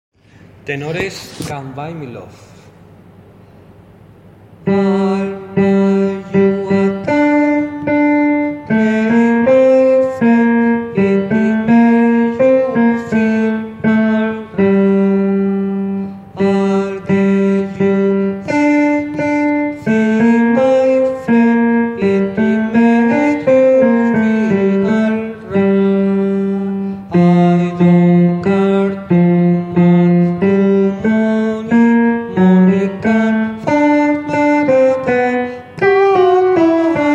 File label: tenor